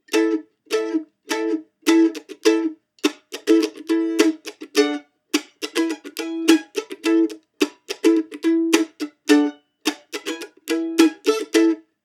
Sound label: half time, mandochords